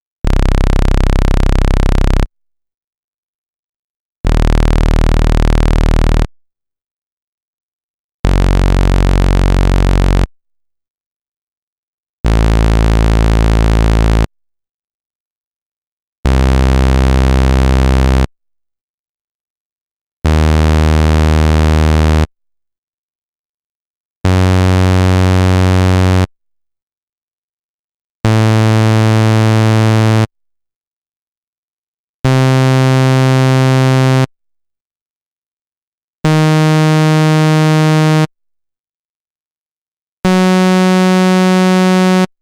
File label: Saw, synthesizer